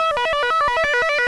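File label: high trill, rock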